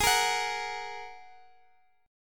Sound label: G sharp 7th Suspended 2nd, Guitar, DADGAD; down; chord shape